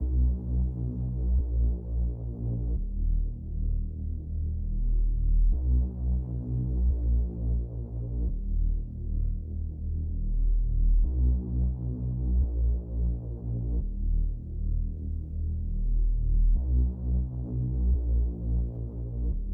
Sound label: bass, 87 BPM D# Min; Stems; Cymatics; Around The World